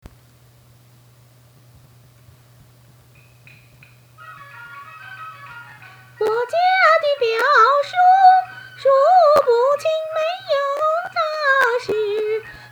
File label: red bright heart with music